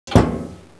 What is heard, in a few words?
washclos; casa; objetos